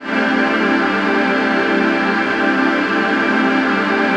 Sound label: PHASE PAD, ATMO PADS; Best Service; XX Large